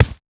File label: run carpet